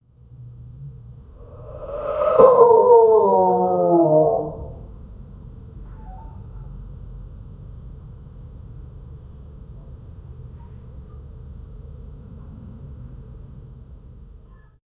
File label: quiet murmurs of awe